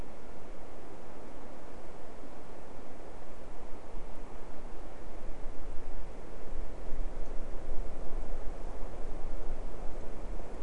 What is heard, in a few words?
no obvious biophony